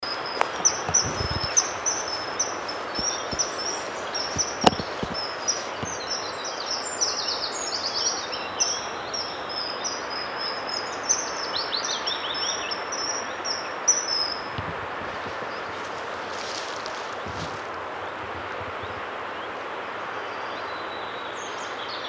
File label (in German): Eisvogel; Erik Eggenkamp; xeno-canto; Den Eisvogel kann mit keiner anderen Vogelart verwechseln. Er ist etwas größer als ein Spatz, seine Oberseite ist blau bis